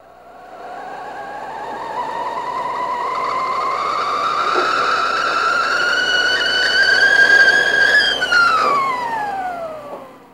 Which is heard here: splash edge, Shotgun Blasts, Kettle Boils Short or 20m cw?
Kettle Boils Short